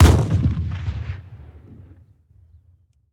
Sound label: tools rifle; teardown; ps5 windows xbox series xs